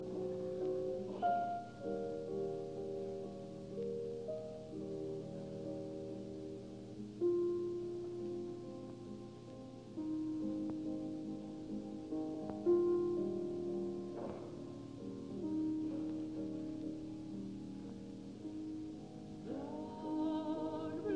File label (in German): fur eine Frauenstimme; 5 Gedichte; Wesendonck Lieder; No 5; Traume; Dreams